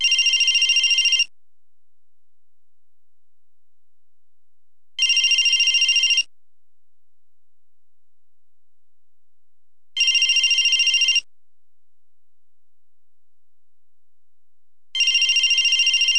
cell phone ringing; Sound FX Real Life

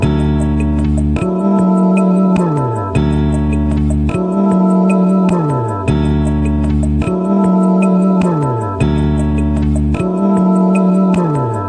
smooth loop, sampled music loops; MELODIC LOOPS

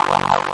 more grunge

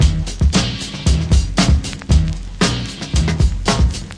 115 dirty two step

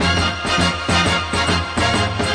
102 horns, sampled music loop; MELODIC LOOPS

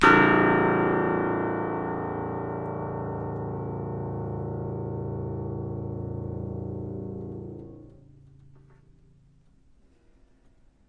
one loud plucked piano note; Piano and Epiano

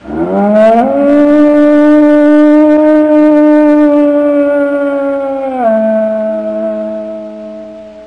wolf howl

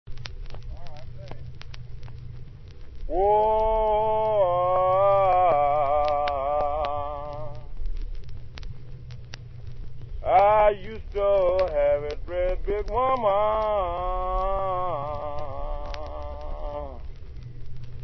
1939 hot spring blues; lomax

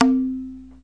"na", Tabla

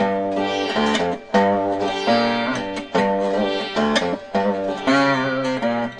160 rattling guitar and sitar; SAMPLED MUSIC LOOPS

